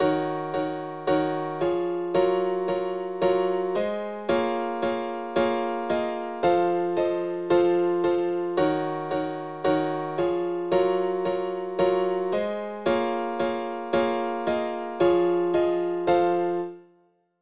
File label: pre-chorus